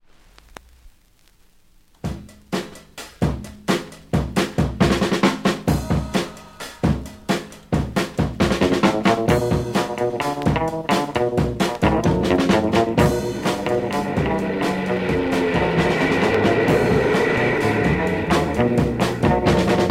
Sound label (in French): harmonicos oiseaux mecaniques